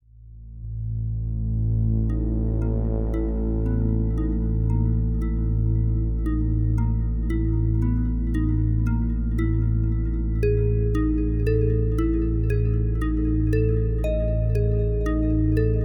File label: Rising